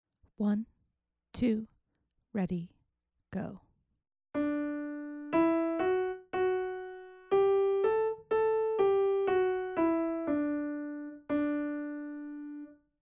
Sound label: melodic dictation